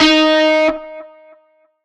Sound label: Shawzin Oct Three D Sharp; Music; Warframe; Narmer Shawzin